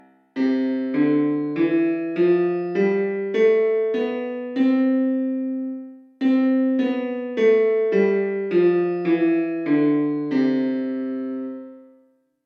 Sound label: piano Cloth Open; 漆喰空間における音響効果